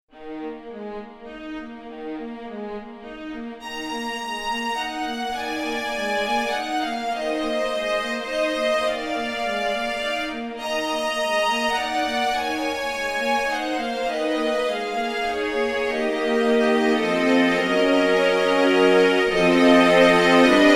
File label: Violas; Montage; cordes